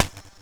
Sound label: Bent Cymbal